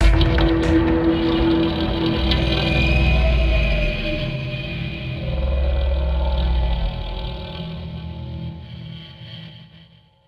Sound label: Music Sting; Lepiota4